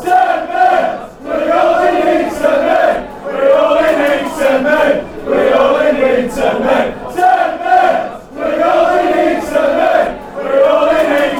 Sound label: ten men, fanchants